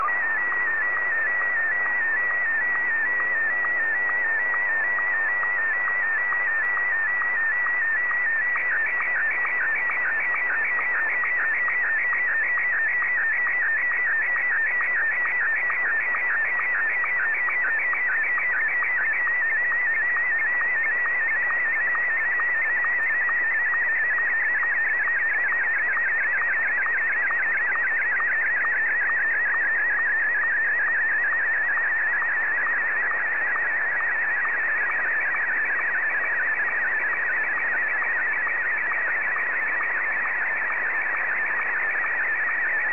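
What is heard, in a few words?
SSTV, Martin 1; ZL2TJM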